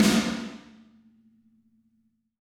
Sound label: Snare Hard